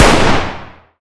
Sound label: fire dist 300blk rif int; gmod; arccw uc commons attachments